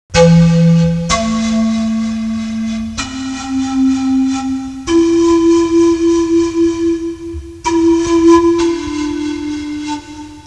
musica strumentale

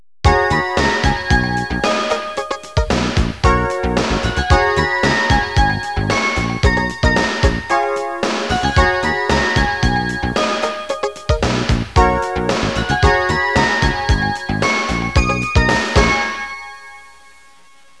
suonerie businessy

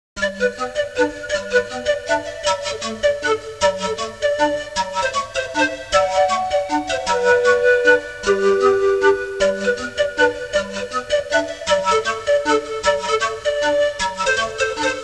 musica strumentale

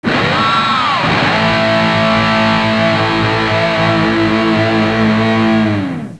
suonerie musica rock

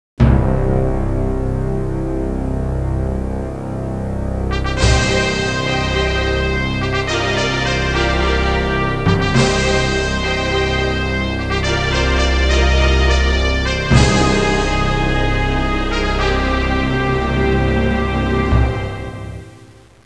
musica sinfonica; symphonic

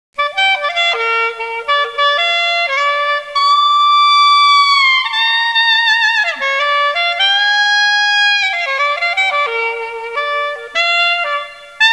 suonerie musica jazz

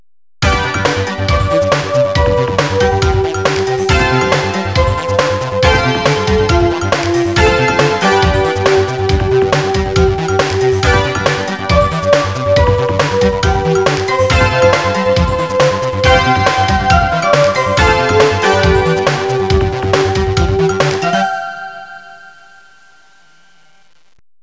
suonerie businessy

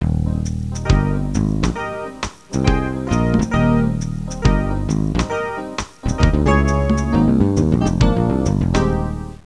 suonerie musica jazz

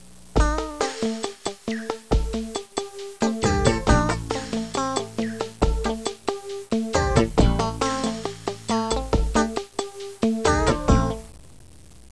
musica newage

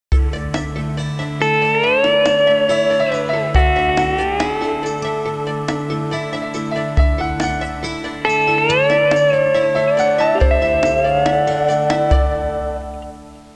suonerie musica hawaiian

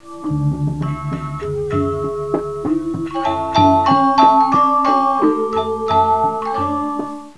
suonerie musica ritmica

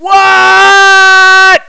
suonerie persone urlare

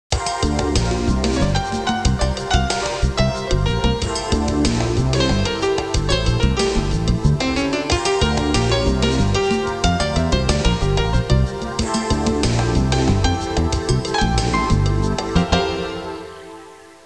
musica urban

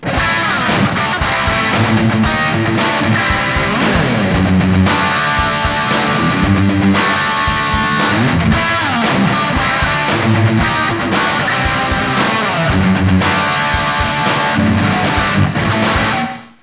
suonerie musica rock

